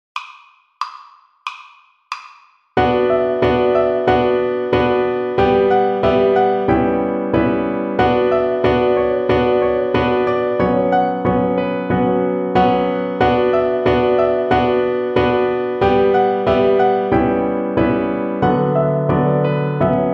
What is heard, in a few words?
Piano Accompaniments